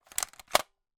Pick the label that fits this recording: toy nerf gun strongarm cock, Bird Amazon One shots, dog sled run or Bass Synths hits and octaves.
toy nerf gun strongarm cock